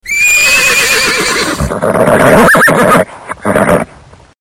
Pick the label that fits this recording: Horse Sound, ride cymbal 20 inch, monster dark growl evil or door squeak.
Horse Sound